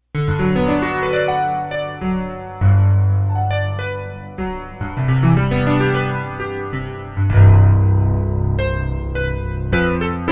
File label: piano yamaha cp70; dss1 demos